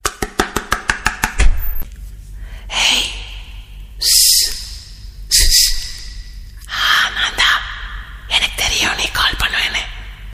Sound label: door knock ringtone